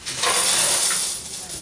cash

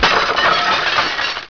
HEAP, robots

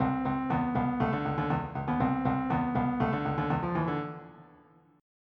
funk; Terra Sound Library

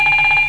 Ding; Glider PRO Demo

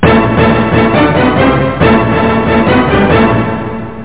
Orchestra; PSION CD 2

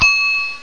DING

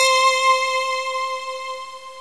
cry; Descent

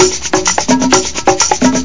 PERCUSSION BREAK

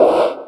Shield; Nelson Piquet's Grand Prix Evolution

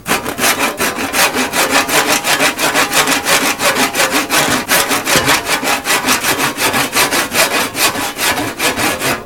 saw

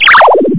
Trill zap

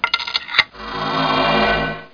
KEY; ROTT

